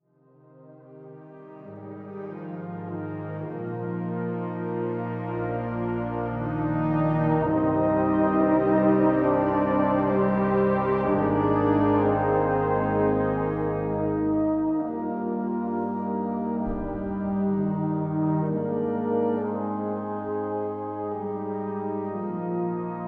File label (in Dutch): Fanfare; Fanfare Toeterdonk; Engelenburcht